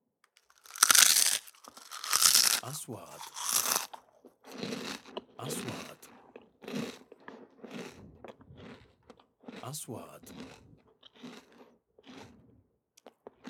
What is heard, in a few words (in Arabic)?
صوت اكل البطاطس شبس مقرمش; sound effect